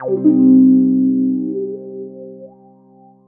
GUI CHORD; EastWest; Dance Mega Hip Hop Electro

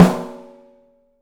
OPEN STEEL SN; 3D Drums

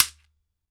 SHEKERE, Drums&Percussion

